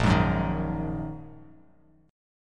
A sharp 7th Suspended 4th Sharp 5th, down, Bass Standard; strummed; chord; JGuitar